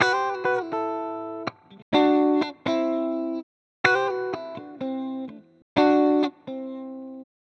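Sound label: electric guitar, ethno, 125, A; eJay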